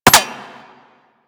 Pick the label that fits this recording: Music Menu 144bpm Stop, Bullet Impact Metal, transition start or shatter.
Bullet Impact Metal